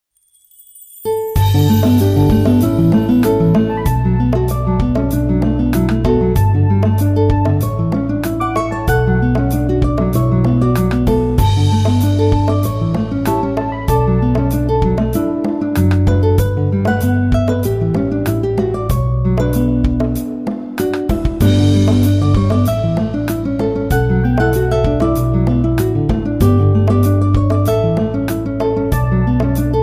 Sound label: acoustic run